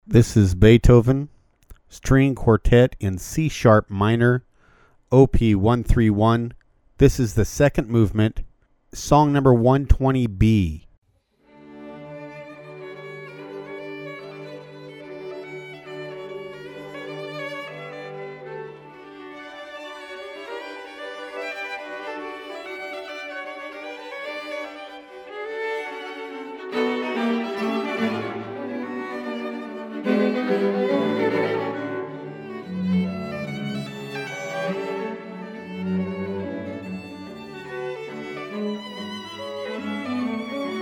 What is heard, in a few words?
String Quartet in C-sharp Minor; Ludwig van Beethoven; Op131; 2nd Movement